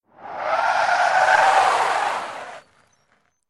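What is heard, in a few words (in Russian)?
автомобильные колёса буксуют на льду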